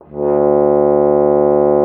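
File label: French horn D2; Xsample VOL-3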